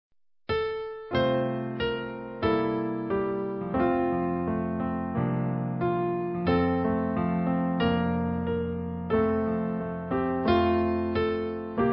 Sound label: Piano; My Jesus I Love Thee